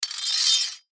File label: Machete Scrape Metal; Machete Scrape Metal Rattle; Knives (Sound Effects:Combat); Blastwave; Warfare